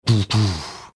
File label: attempting sound; law order sound